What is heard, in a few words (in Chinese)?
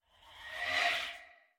恼鬼待机声